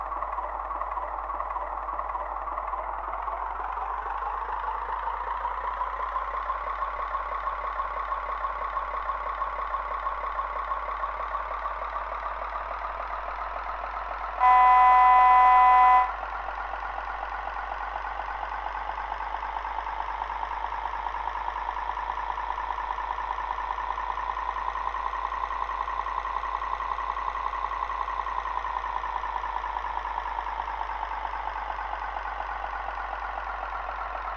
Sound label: diesel; zeleznice; zvukove moduly